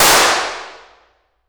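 hats cloaks visitors, impulse response, Elveden Hall Suffolk England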